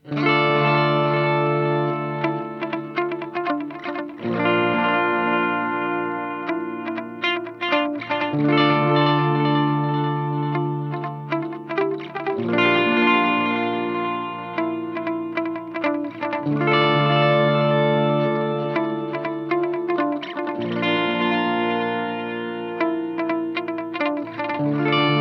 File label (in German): Boss SDE-3000D vier Delays parallel